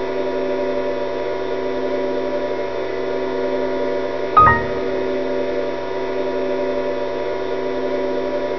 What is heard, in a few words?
bsod loop; wartso; ambient